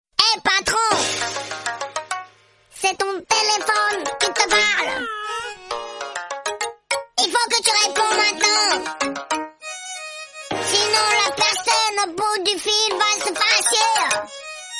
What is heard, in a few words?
Baby hiphop